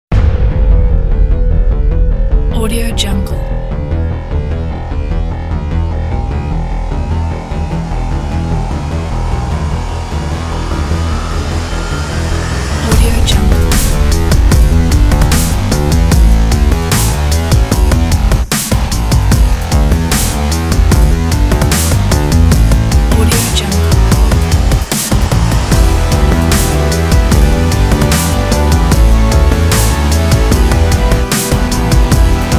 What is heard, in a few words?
big epic hybrid trailer; music2noise